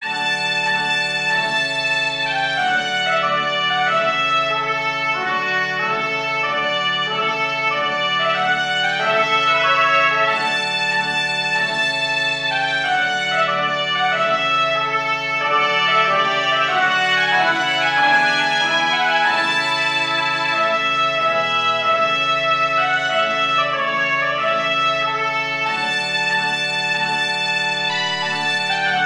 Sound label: Trumpet Tune and Air